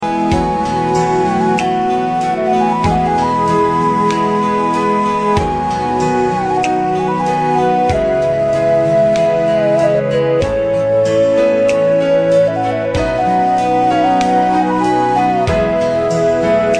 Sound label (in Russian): Kuraj tatarskij nacionalnyj duhovoj, Tatarskaya narodnaya melodiya; Taftilyau